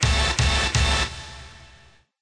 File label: combo